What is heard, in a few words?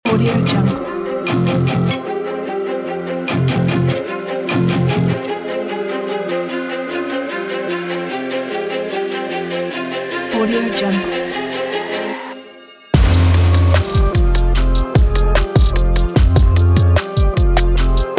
epic trap hip hop